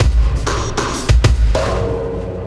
Sky Lab Beat, Processed Drumkits

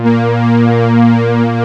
JUNO-60; USB Soundscan vol.49; Analog Fantasies; AKAI

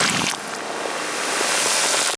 SPLAT FACE; Distored Reality

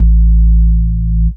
sub A, techno trance bass

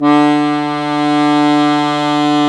Accordians, Accord Trio; Club-50 - Foundations Roland

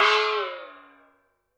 BOX FX; Univers Sons - Raricussions

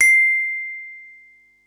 mallets

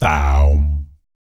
BS, Vocal BASS FX

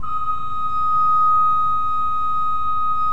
KOPPELFLUTE D5, Cathedral Organ; Propeller Island

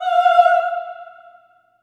Staccato F F5 L, Symphony of Voices; Spectrasonics

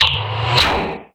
SLAMMIN; MACHINES

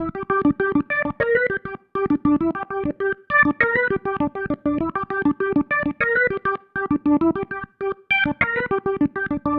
Pattern, Organ

